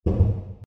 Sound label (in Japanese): 倒れる音, 効果音; ジングル